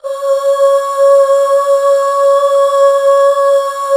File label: UUHH, Back Uuh Fem; Sound & Vision - Gigapack II CD 1